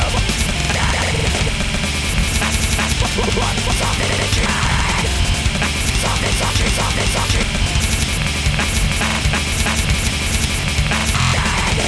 metal recompose

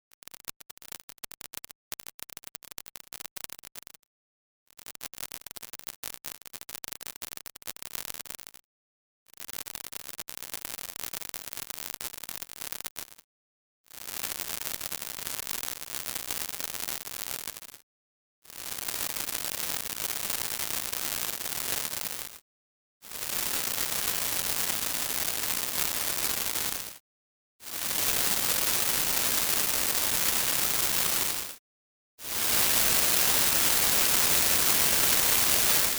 Gaussian Pulse Trains

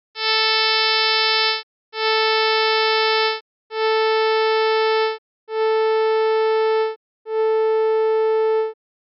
Rolloffs

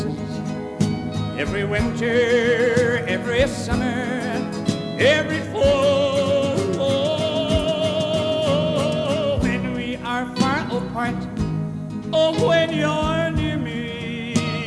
country

